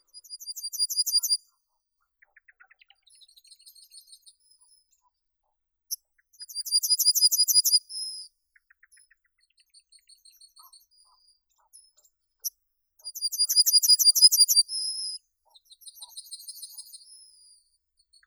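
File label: yellowhammer, Emberiza citrinella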